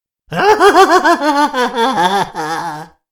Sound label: Voices Hehe; freaky clown town mystery windows 2020